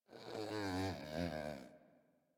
Pet Yawn; Enemies; Warframe; Catbrow; PetShip

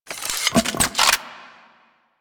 Rocket Launcher Reload; Warframe; UT Weapons

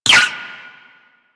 Ricochet; Warframe; Cowgirl

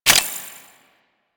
Mineral Pickup; UI; Pickups; Warframe

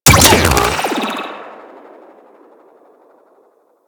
Shock Rifle Alt Shot, weapons; Warframe; Corpus

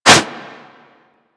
Whoosh, Weapons; Warframe; Furax

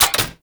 spear throw mech; Warframe; Weapons; Corpus; Spear Gun

